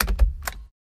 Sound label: Stapler Staples Pap